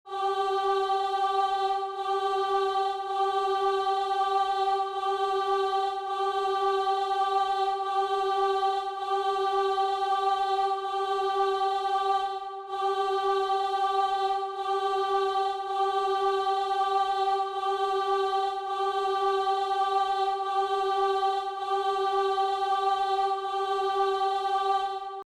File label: follow drone